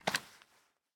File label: Remove tv; miside demo windows gamerip 2023